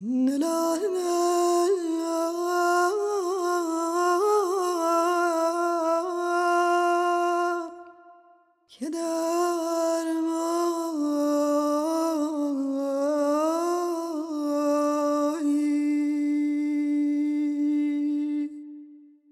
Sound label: ethno world 5 iran; best service